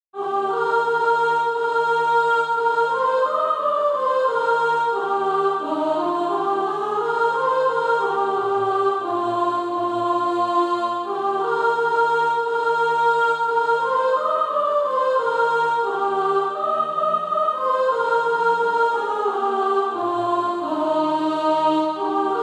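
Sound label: soprano, Practise Music; SATB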